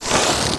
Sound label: skill lightning chain cast